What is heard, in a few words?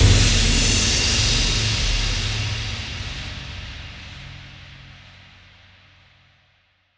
Splash Hit; Ghosthack; SkyRealm